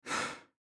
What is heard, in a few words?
Human Sigh Breath, Sigh Breathe Out Element Male 25 Yrs; Sighing; Blastwave